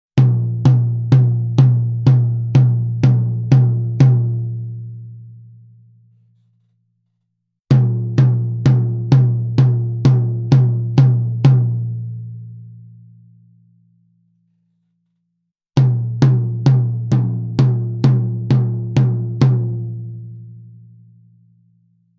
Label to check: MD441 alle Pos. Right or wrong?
Right